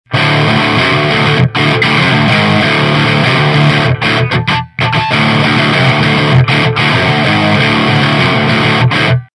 Hex 50 Savage Mode; Dan Hopler

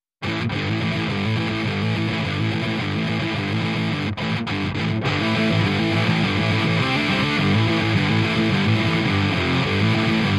Hi Gain 2 LP Hex

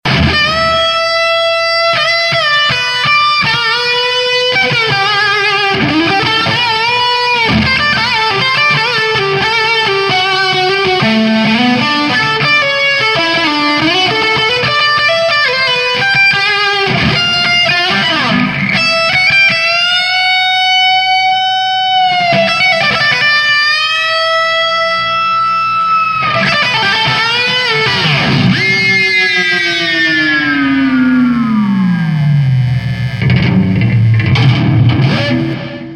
Witchdoctor 10 Watt

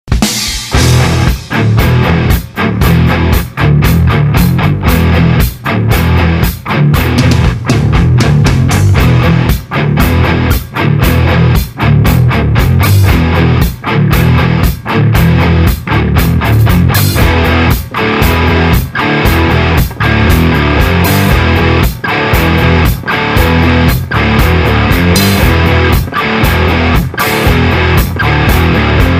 Heavy Hex

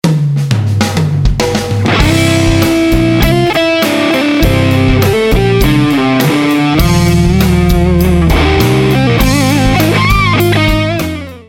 Peavey Classic 50 Distorted

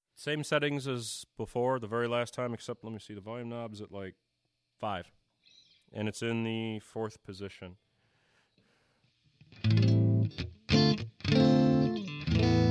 V hex Strat 4 pos Clean

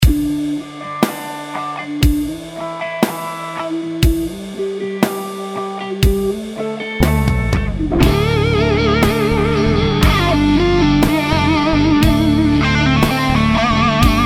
two channel wah

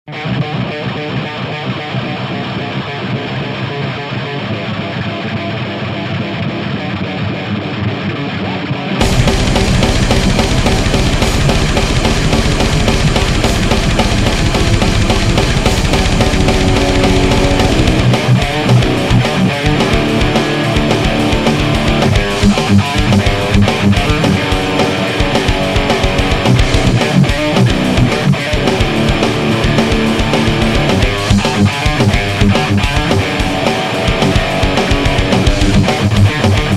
Modified 5150

